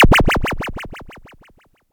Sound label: Perc; ER1 EPerc42 05; RHYTHM WORKS feat ER1